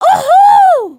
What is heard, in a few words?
double wave, emotes